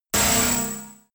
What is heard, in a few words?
sci-fi laser shot